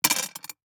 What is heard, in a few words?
DICE on Glass, Throw and Roll, Standard, 3 Three Dice; Samples; ArticulatedSounds; DICE Sfx Party (Extended)